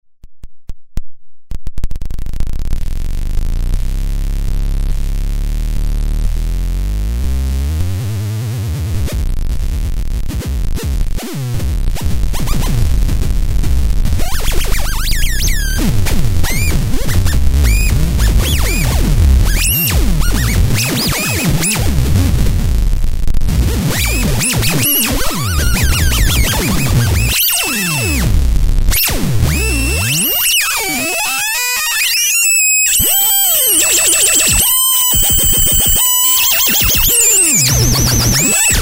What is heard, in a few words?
Synth Bomb; audibleDisease